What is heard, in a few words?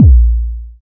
tr 808 synth kick drum a sharp key, tr 808 analog kick drum tuned to the A# note; kick drum samples